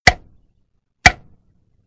clock; de library

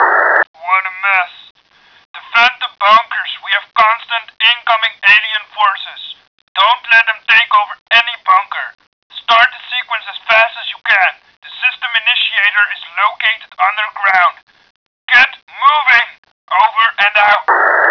start of battle

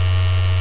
soda

